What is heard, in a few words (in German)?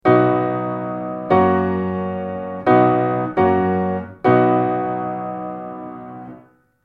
akkordfolgen